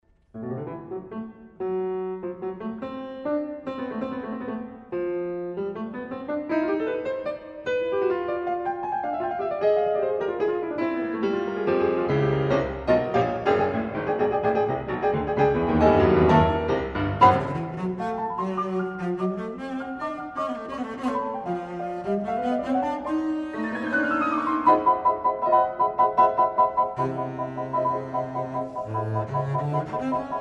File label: Sonate